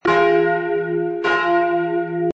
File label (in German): glocke f1